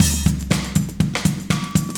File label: 120bpm loops; Sound Effect Library